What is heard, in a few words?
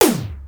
MPC 60